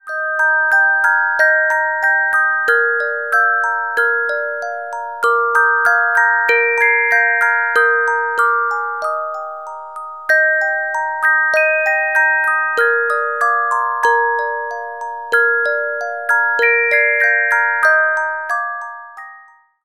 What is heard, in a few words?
Carillon Music Box Melodies